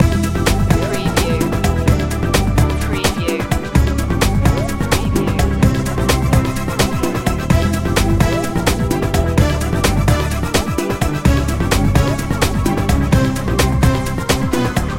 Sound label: secret agent loop